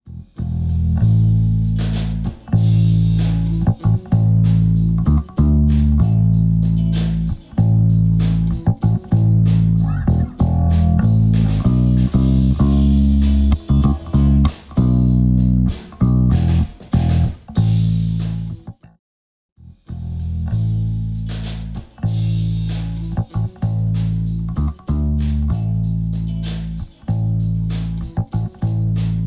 bass muddy clean, Muddy bass guitar cleaned up with low-mid attenuation; Mixing for God audio examples